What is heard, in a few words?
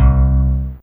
Guitar C3, Fairlight CMI IIe